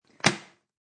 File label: Tupperware Close, The sound of tupperware being closed; Kitchen; Household